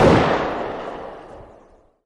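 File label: m4a1 single distant, gun; arccw go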